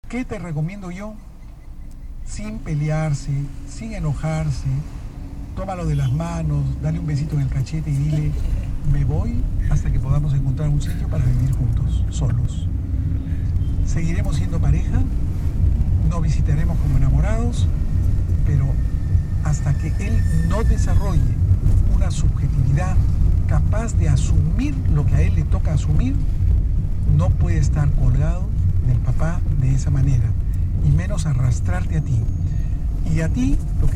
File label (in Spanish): peru cusco transporte taxi radio